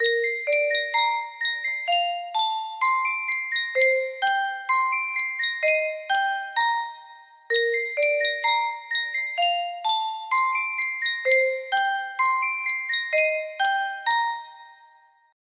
music box, music box melody

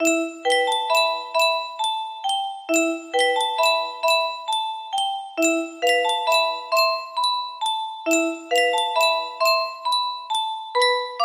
looped intro, music box melody

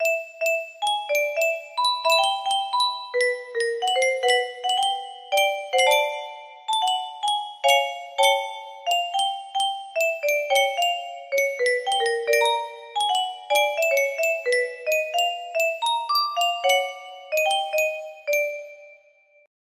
unfinished music box; calibers

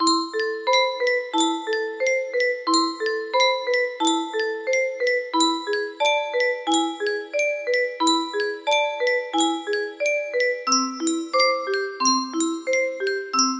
music box, music box melody

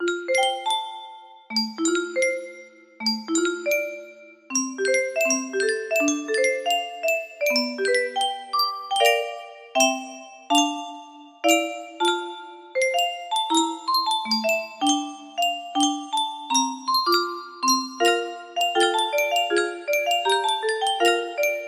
C 30key, music box melody

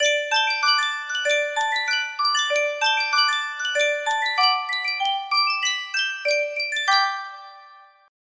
Sankyo miniature music box

